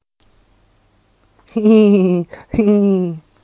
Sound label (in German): lachen; kinderspiele1